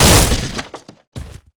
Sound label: Collision